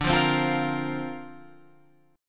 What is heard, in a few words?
D-Minor 7th Sharp 5th D Guitar Open F, chord; Dm7#5; strummed